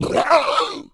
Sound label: zombie death